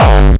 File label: Gabber, kicks